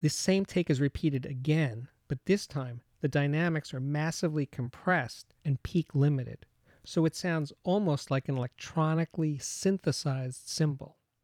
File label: Cymbal Dynamic Range Heavily Compressed Announcement; The Ultimate Headphone Demonstration Disc ( 24Bit192kHz ); Chesky Record